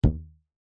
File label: foley elastic fabric strip ping